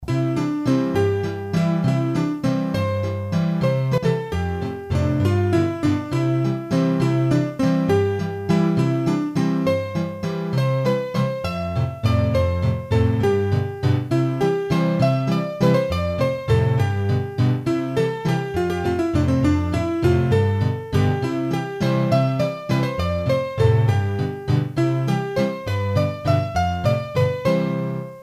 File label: Valse, hurdy-gurdy; Valse a Fleuret; trad